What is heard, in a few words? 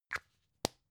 plastic spray can small close